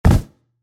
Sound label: muffled; افکت صوتی